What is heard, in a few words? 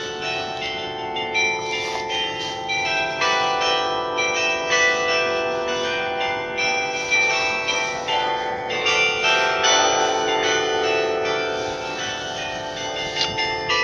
st petersburg glockenspiel; russland